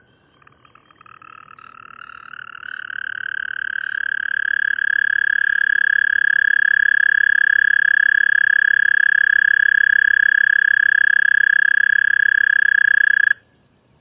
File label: American Toad, Anaxyrus americanus; Amphibians; Active: Early spring